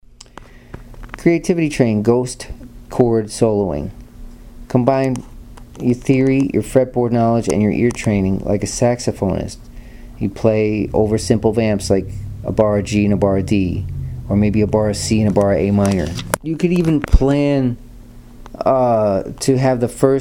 ghost chord soloing

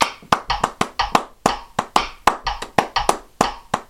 clapped, Claves, Afro Cuban

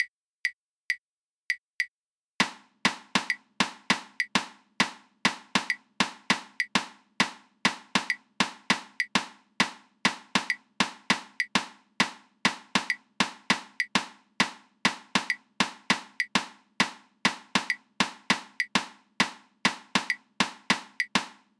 3 2 skeleton 200 bpm

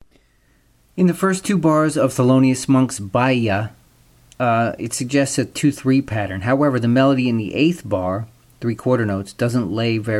latin jazz

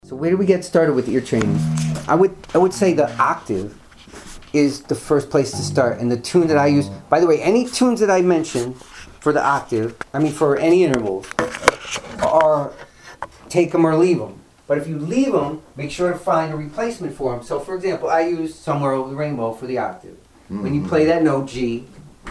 ear training with bass player

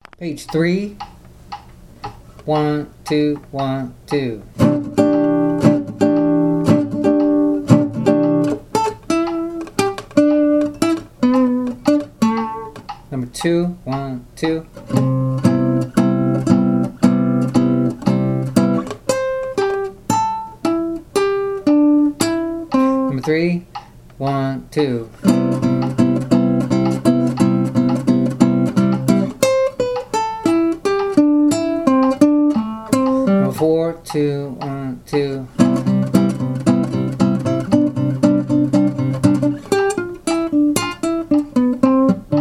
brazilian conception rhythms, Guitar; Latin Guitar by Bruce Buckingham

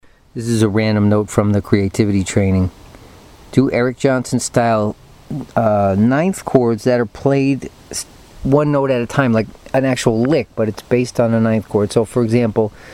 9th chords

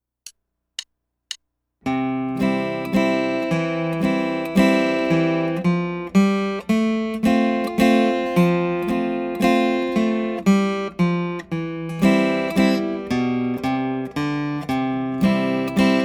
Country Waltz

